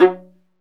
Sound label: Viola Solo